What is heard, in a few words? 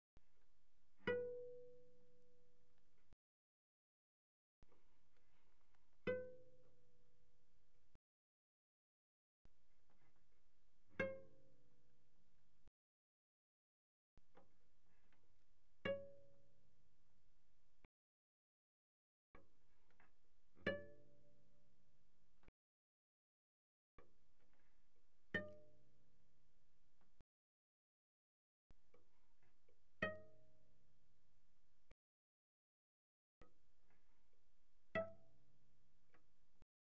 Cello pizz pp sul A B4 Ab5; Strings